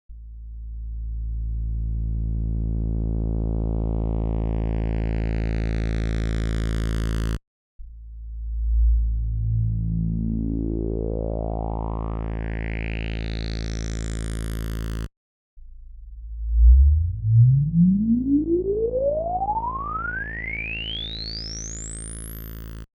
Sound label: LP Filter sweep comparison; Virus (Left) and Viper (Right)